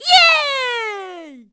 Yay; Ivan (Killer Bees leader); Zelda

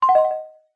Main Menu Letter Back; Delete a letter (Recorded)

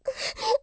Sob; Beth

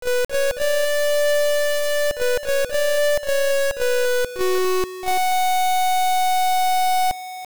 Organ Of Evening Calm; Sirens Instrument; The Organ of Evening Calm

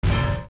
Steps Iron Boots

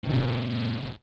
Raspberry; Giving Link the raspberry; Tatl

